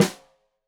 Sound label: Snare Stereo, Drums; Deeply Sampled Drums